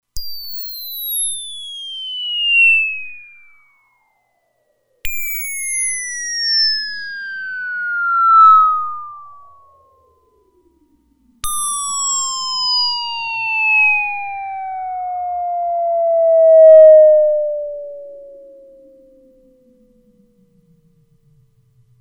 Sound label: Piccolo Pte, Roland Alpha Juno-2; Factory 2